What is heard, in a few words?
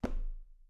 Step on wood